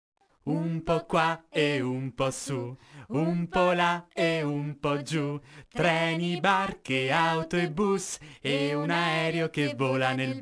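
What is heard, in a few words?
Song Vocals Clean up song, Italian; cocomelon play with jj; android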